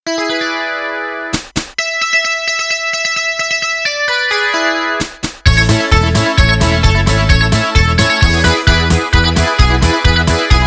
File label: Rocking Polka